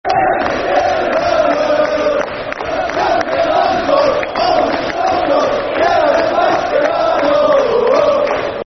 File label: Best Midfield In The World, Football Chants (Soccer Songs), Liverpool